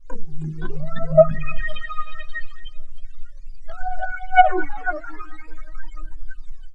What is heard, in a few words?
plinkity whoo minus one octave